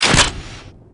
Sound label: camera snap; heita